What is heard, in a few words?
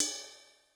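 Sizzle Dark, Cymbal; Yamaha DTXpress-II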